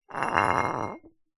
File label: foley glass bottle 1 ltr slide slightly gritty tiled floor